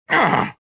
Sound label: pain; reborn